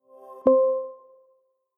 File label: Whisper Disconnected